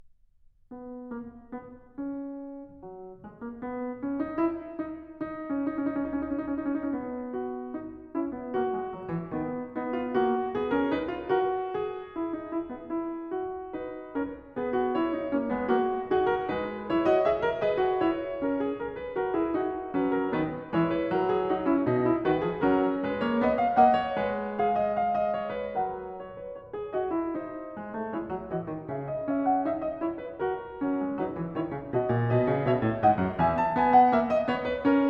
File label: Well-Tempered Clavier, Book 1 Fugue No. 23 in B major; Bach; BWV 868